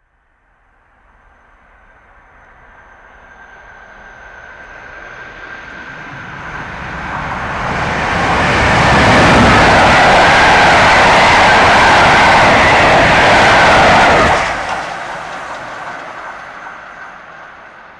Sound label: Overhead Electric Train Fast By. Large Roar When Passing.